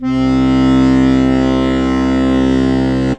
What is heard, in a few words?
HOHNER, akkordeon